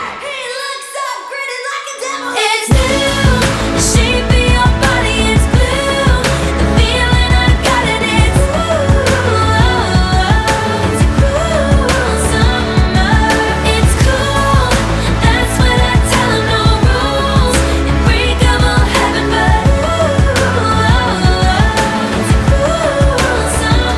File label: Cool Ringtone